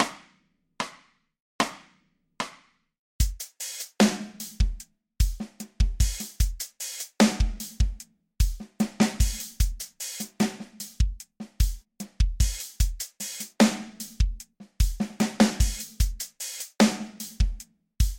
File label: A Minor Drums Only